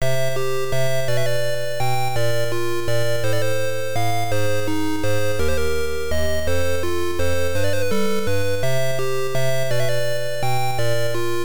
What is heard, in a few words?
Scenario Clear; Sorcerian Selected Vol.2; Sharp X1 turbo; Burning Trap